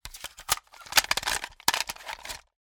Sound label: Mixtape cassette box